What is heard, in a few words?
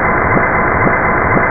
noisy seg